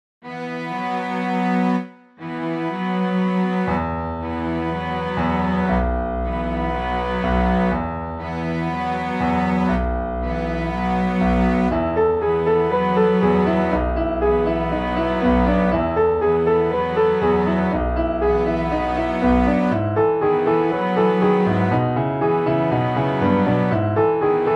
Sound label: Taut strings won't stop resounding